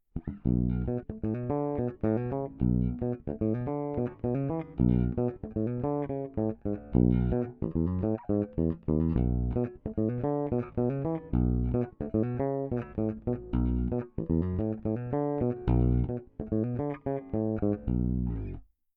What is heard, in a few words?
passiv parallel Bridge Pickup